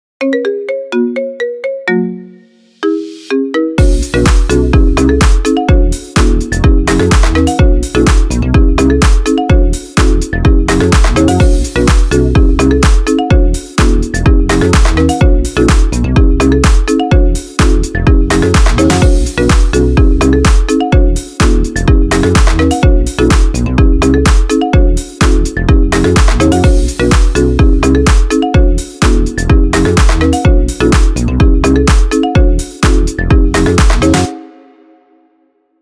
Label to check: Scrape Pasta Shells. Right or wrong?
Wrong